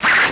SWISH SEQ, swishes; Total Control - Action Cutz

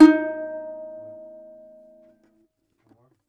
DOMRA, Segundo Domra, Plucked Strings; E-MU Producer Series Vol. 7 – Old World Instruments

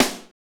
snare R B, Roland snares; Northstar - Drumscapes Roland

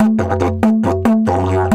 F 140, DIDGE RIFFS; Big Fish Audio - Didgeridoo and Other Primitive Instruments

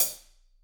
HH, Drums; Bob Clearmountain Drums I

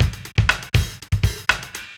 live beat; Sonic Foundry (Sony Creative Software); Ugly Remnants VOL-2

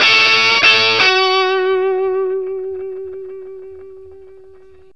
BLUESY, Vintage Blues Guitar; BLUESY 60; AKAI; USB Soundscan vol.22

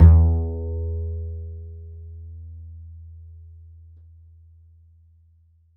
D, Upright Acoustic Bass

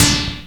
Snare D2, Moog mg-1

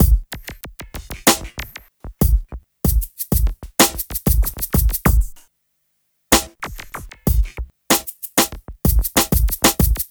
Smooth, R&B Drums

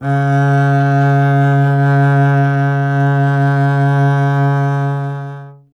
STR CBS, Solo Instruments

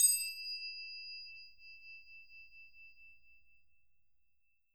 Bell L, BELLS; Roland MV8800

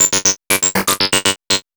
POLYMOD; AMG - Vince Clarke Lucky Bastard

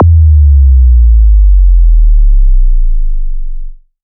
Sub Kicks, Bassdrums

